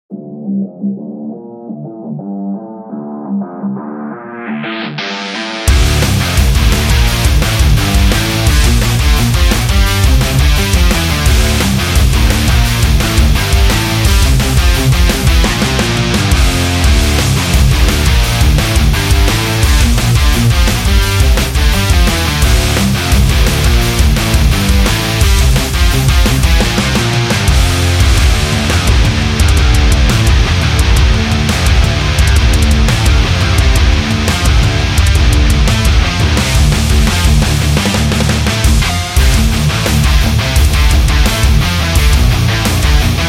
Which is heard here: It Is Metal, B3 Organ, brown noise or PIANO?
It Is Metal